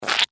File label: Ketchup Squish; Food Sound Effects; Household; BlastWave